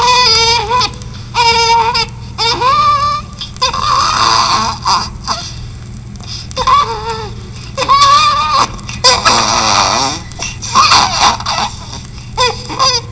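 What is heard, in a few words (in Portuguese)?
anel vascular, sons e choros pediátricos; PEDIATRIA UFF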